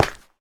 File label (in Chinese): 蛙明灯踩踏声